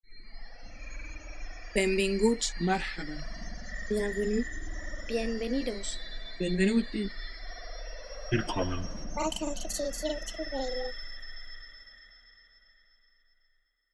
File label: jingle